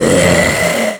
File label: death kobold taskmaster; dota; creep deaths